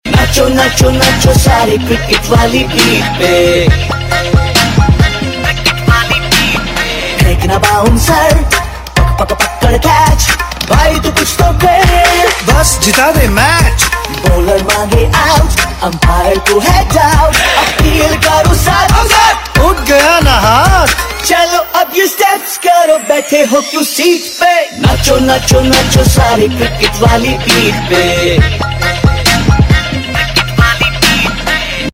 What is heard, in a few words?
Cricket Wali Beat Ringtone; Sachin Tendulkar